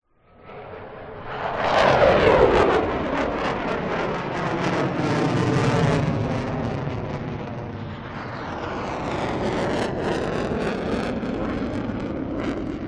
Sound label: two f 16 jet fighters passing by overhead side by side and vanishing; Sound Ex Machina